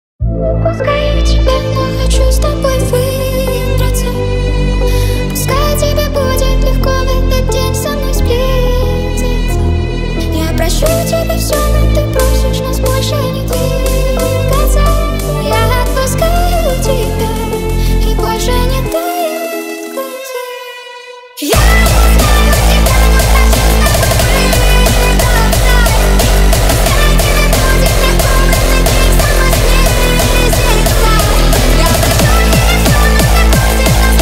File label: hardstyle remix